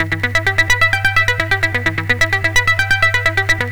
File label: rusty analogue E 129, synth loop; Zero-G; Soundclash; Nu Dance Electro